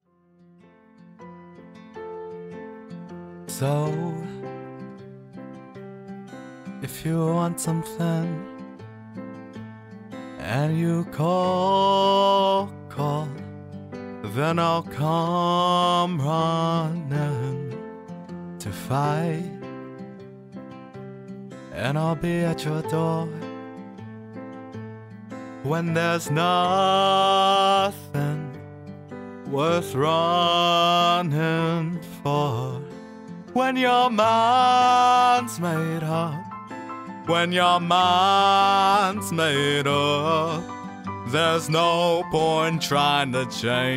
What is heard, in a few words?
Singing